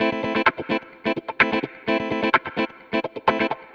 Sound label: Electric Guitar - A min, 128BPM; Electric Guitar Kit; Vengeance Samples; Special Sounds